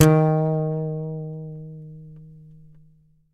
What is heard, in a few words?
YAMAHA5 SUS D#3, Bass; Sampleheads; Will Lee Bass Library; YAMAHA5 MUTE